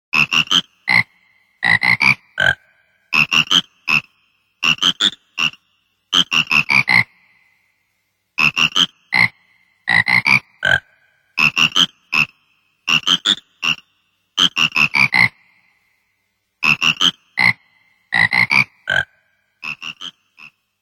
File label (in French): Grenouilles chanteuses; Majora's Mask